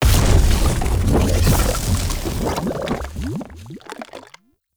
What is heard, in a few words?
Lava Burst; Ambience; Props; Warframe; Tau; Circles Of Hell